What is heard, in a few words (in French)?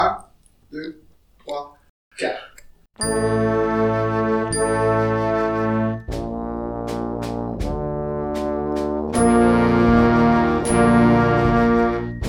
les basses en vedette noire à 80